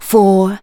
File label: vox, Techno Trance; Ueberschall